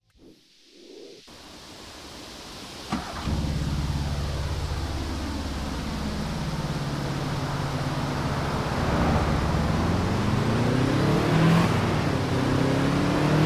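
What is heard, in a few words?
nissan skyline gtr r34, car; TDU-1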